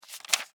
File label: Book Paperback Page Turn Single Short; Office; Offices